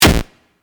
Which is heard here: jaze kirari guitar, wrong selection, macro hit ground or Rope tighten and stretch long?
wrong selection